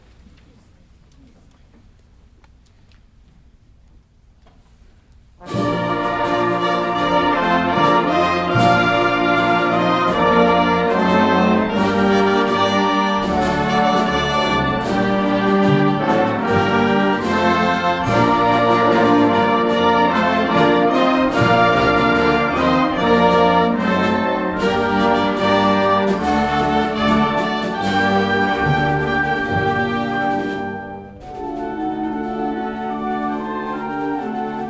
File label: Ukranian National Anthem; Spring